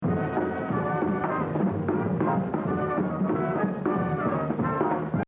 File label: Exercise 11, Jazz Drumming - 100; Gene Krupa; The Evolution of Jazz Drumming (Gottlieb)